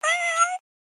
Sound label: Kitten Meow